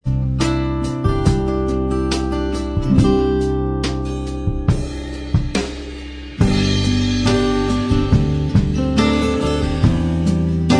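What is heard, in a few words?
acoustic style instrumental track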